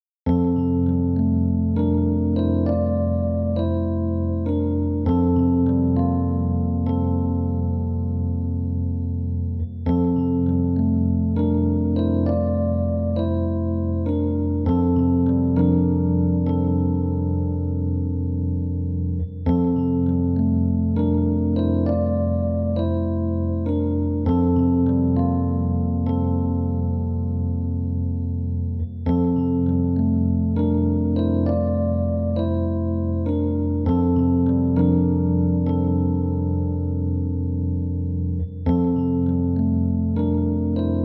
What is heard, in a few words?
Conversation Screen; bullet girls 1 2 soundtrack